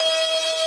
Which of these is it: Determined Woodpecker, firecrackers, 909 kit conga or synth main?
synth main